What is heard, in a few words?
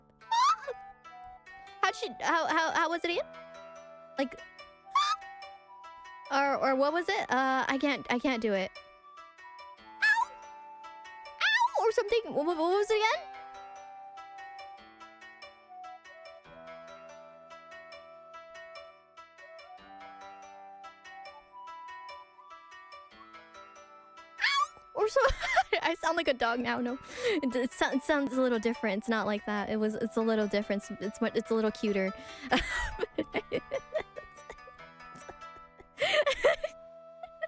burp; IRyS